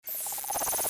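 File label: weird noises; lolicore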